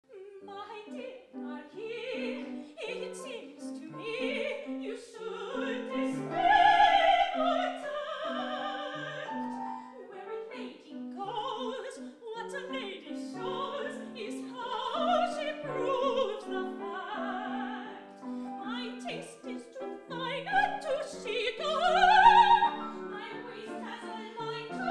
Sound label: laughing song